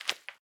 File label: jump concrete; The Swapper